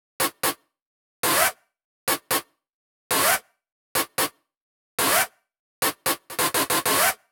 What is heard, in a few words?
Minimal Loops